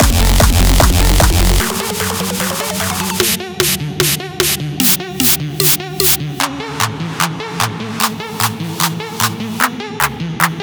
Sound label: customizable drums